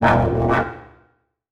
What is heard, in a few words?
Transition Hit Impact Warble; Adobe; Production Element